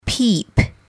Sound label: peep